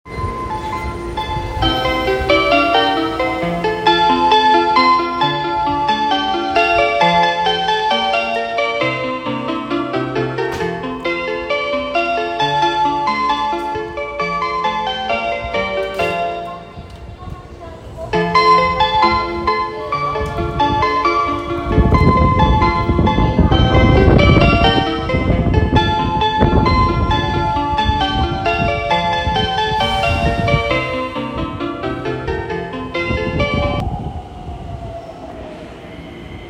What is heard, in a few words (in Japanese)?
京急本線平和島北接近